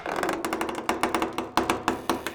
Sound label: metal stress; Counter Strike Model Pack; CS:GO; survival